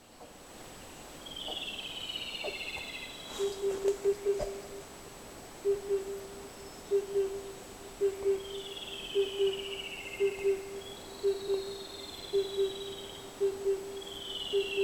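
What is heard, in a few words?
L sibilans 2 males song background C satur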